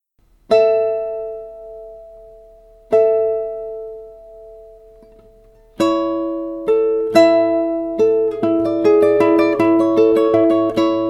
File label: Ice Xylophone